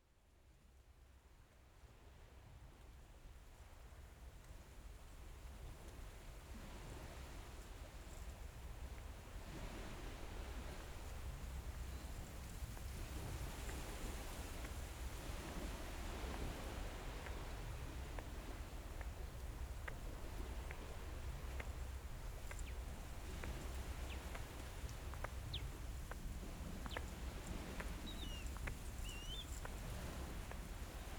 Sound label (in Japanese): シーグラス みつけたぞなも（猫島 の 海沿い の 音。岩場 での シーグラス 探し）